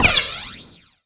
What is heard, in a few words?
bullet reflect